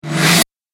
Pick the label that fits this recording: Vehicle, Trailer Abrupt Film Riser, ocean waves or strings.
Trailer Abrupt Film Riser